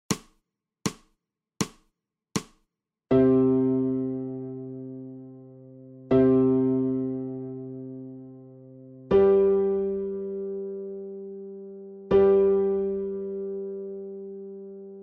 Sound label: ALTO